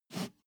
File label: step barefoot metal slide short